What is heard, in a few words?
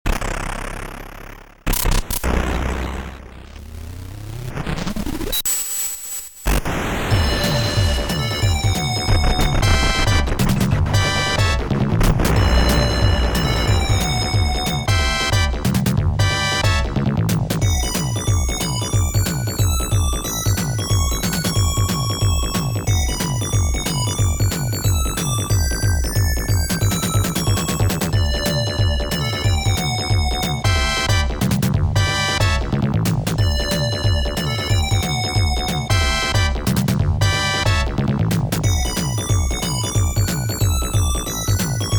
All Clear; Super Darius; TurboGrafx-16